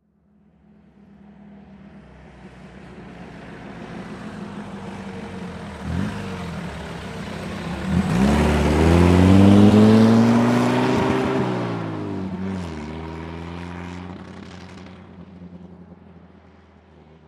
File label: Army Jeep Med Accel By